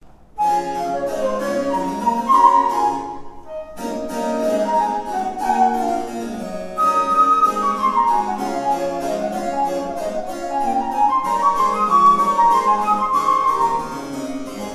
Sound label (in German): Allegro aus Sonate D-Dur; CPE Bach